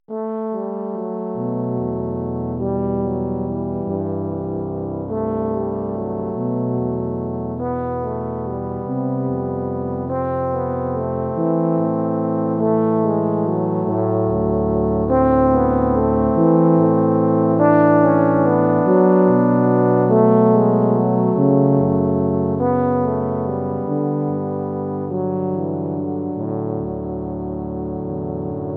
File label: Tuba Suite Etude